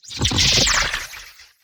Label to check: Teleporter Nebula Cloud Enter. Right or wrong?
Right